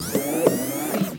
Servo Panel Sound - Servo

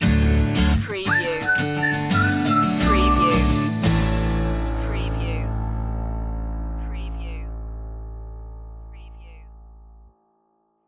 whistle acoustic stinger

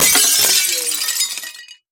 Glass Break Large

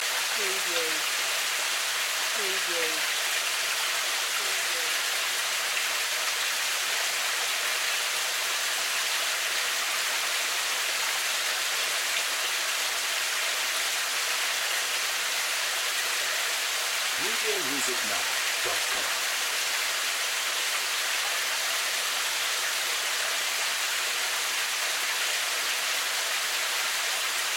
Cave Stream; sound effects bible

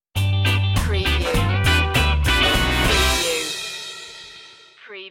rock steady rockin stinger

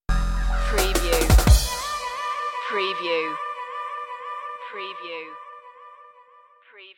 new dnb dream stinger

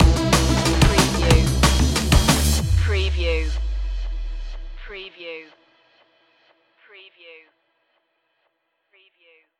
night drive stinger

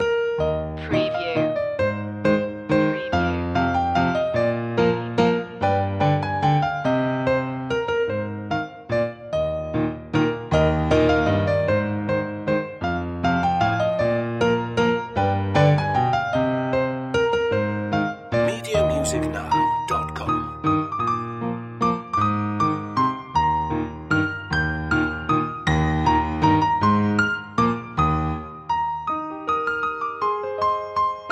we wish you a merry christmas piano loop long; media music now

